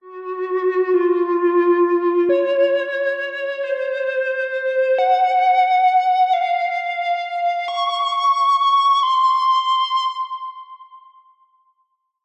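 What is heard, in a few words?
science fiction 50s style synthesized accent aliens weird theremin; zapsplat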